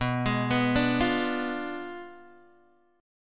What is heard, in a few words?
B Diminished 7th B down Guitar Open D